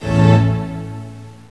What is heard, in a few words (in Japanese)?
Strings Hit, TrinityのChamber Stringsです。; StringsHit1